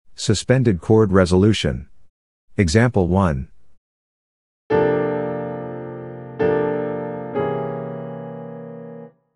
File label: Sus chord resolution; Sus chord resolution example